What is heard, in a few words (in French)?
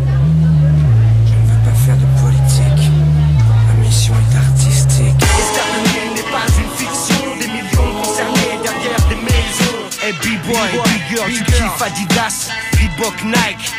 Chanson rap